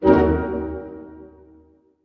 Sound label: HHIT D# MN, HARP HITS; Sound On Sound - Peter Siedleczek's Orchestra